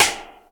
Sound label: sfx perc